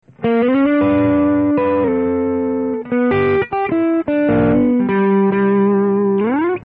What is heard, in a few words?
neck dirty, pickups; johnson